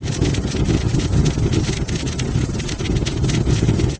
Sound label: fire